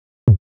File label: Space Drum Loop - 95 BPM Tom; Loop Stems; Cymatics